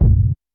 verby steel kick drum sample a key

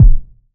subtle reverb bass drum one shot g sharp key

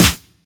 rich top end snare single hit E key

